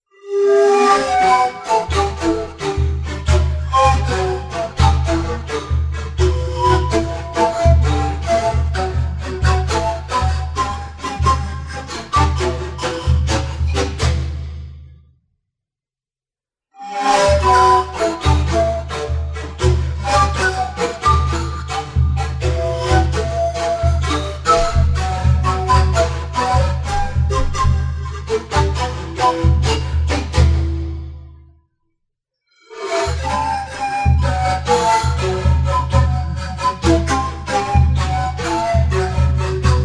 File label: Sikus